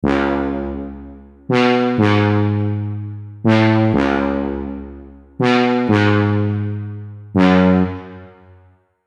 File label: Brass; Metal; Unison Billboard "Hot 100" Collection for Omnisphere